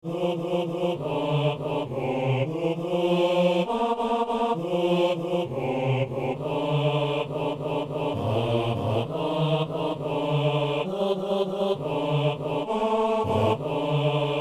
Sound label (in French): basse; Messe coutrillonne - Alleluia